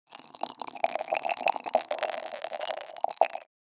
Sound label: Shaking a thin glass sphere with a bell inside